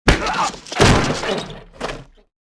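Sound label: wild west sounds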